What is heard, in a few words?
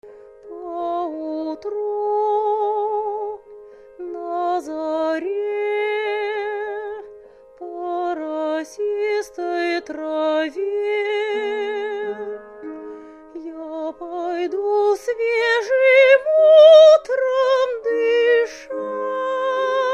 Oktava ML 11M ribbon mic Romance